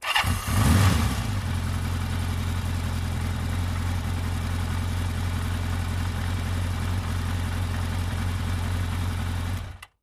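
Motorcycle, Kawasaki 750, Start Close Up, Idle, Off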